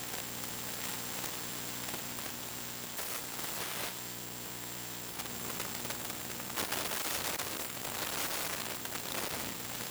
Electromagnetic Macbook Idle Loop Mono; Essentials Series NOX SOUND

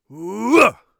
heavy attack, Warrior Voice; Warrior Voice Pack

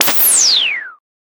Noise Sweep Down; FREE SFX PACK VOL 3

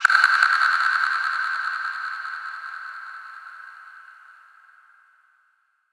Delay Reveal; FREE SFX PACK VOL 3

